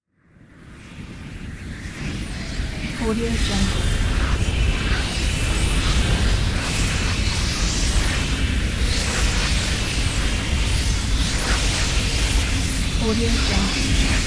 vortex wind

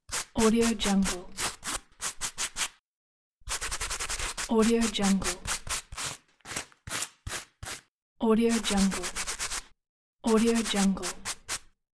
cleaning shoes

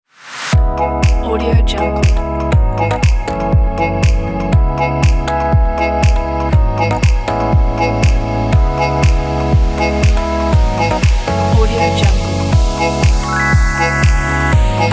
chillout house

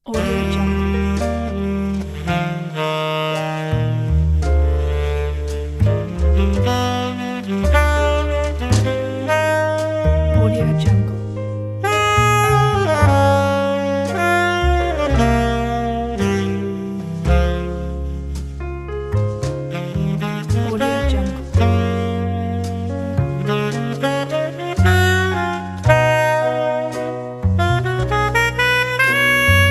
whiskey jazz ballad

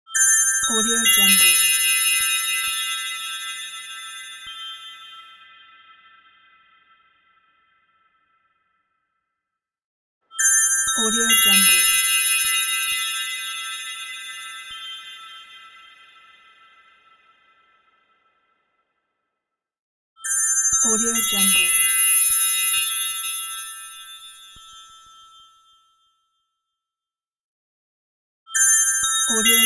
magical

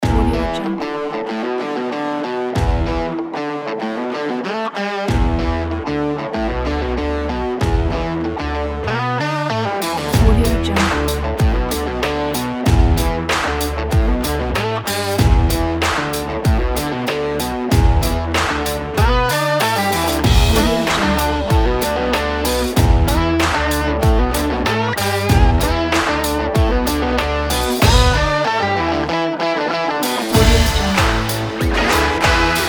brickwall rock; smidsmid

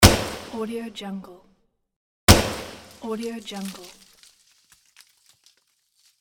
petard

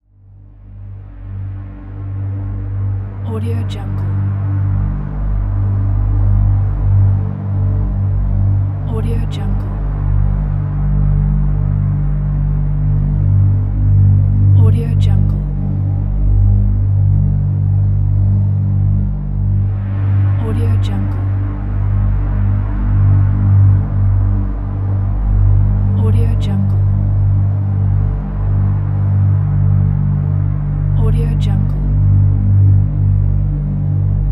drone

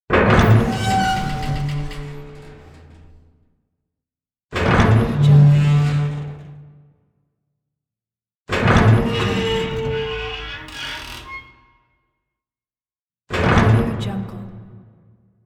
metal door opening; sounddivision

